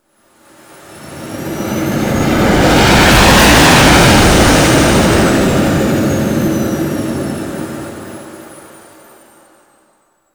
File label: Ship Movement; Grineer Settlement Capture